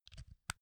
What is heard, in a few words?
insert mini jack phone; objekte; elektronik